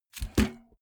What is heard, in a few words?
bmx bike bar spin regular